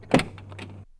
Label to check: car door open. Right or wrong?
Right